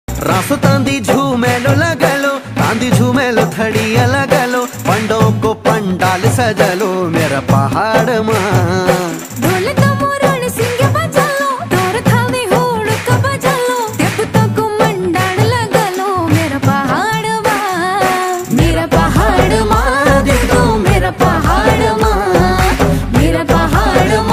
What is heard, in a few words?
uttrakhandi ringtone